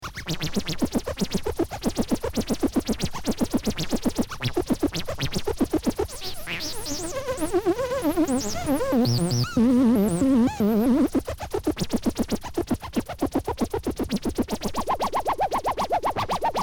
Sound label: Moog Shots